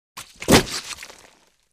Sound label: Body Fall Juicy Slow; bodyfalls